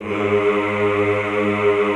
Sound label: EHH G#1, Classical Choir; Best Service